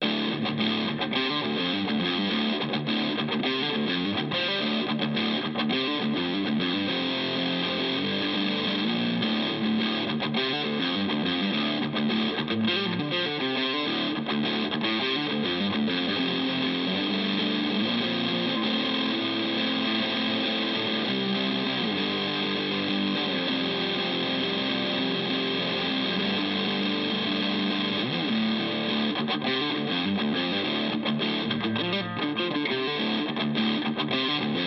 Headrush MX5 Amp Distortion

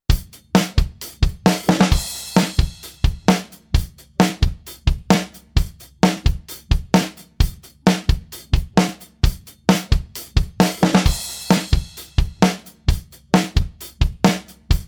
Korg KR 55 Pro 8 Beat

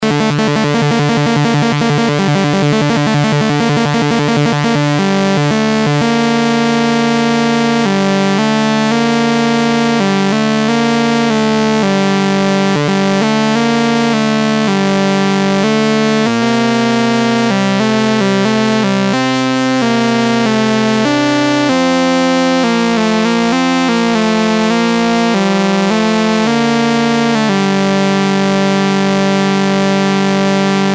Classic Sync

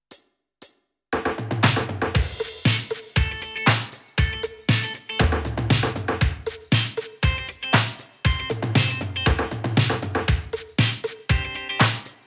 Yamaha Genos Style Jam

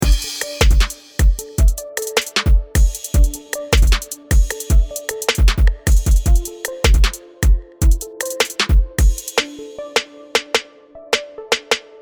hip hop atlanta kit

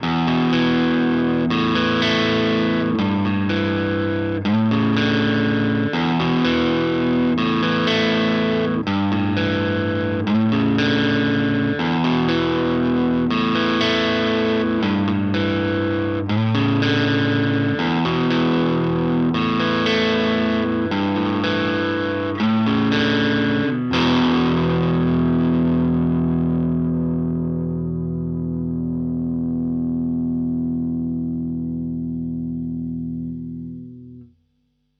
Hagstrom Ultra Max Both

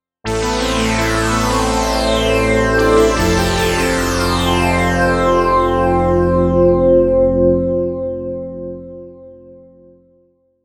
Squelch

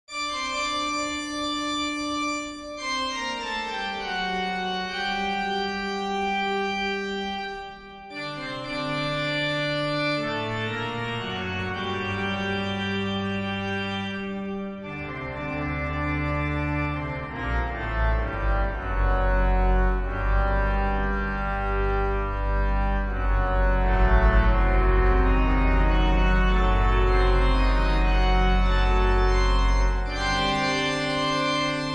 Church Organ

